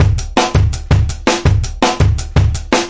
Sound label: live circle round, drum loops and breaks, 165 bpm; 161 to 180 bpm